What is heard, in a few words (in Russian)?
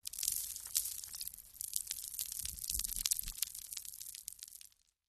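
падения обломков стекла мелкие осколки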